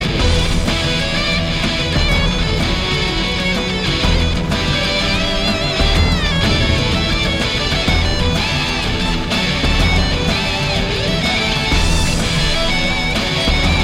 Guitar Solo; Halo

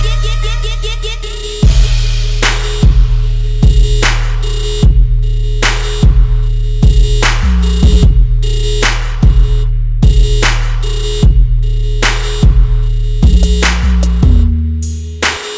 Trap

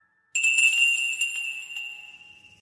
bell; Tokyo Ravens

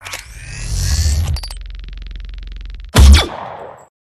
Pistol; Doom

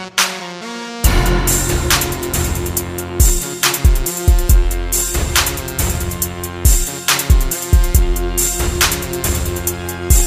Tropical Music

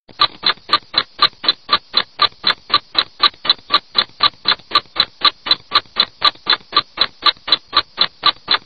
Fast Timer

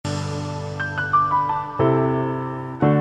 Sweet N Soft Sms

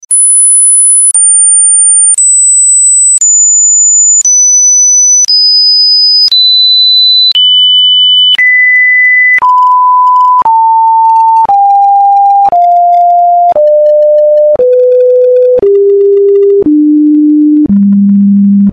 10000 Hz -1 Hz

